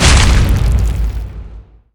Rock impact

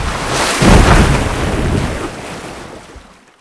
Water Armor

